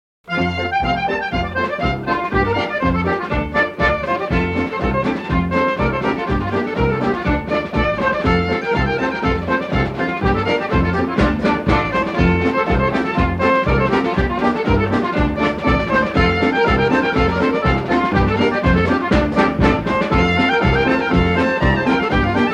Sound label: drums (instrumental)